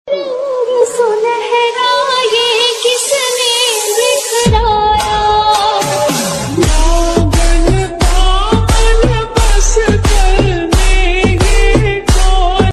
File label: DJ Remix